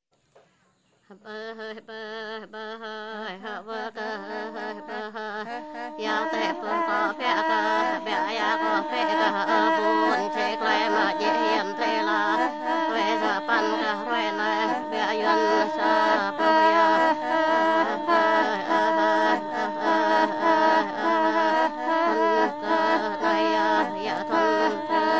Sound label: Vietnam Anthology Of Ede Music vocal Hocket; Musique Du Monde; Ae Rei